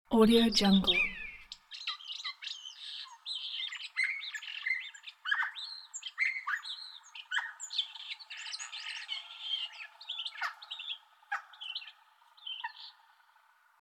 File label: starling singing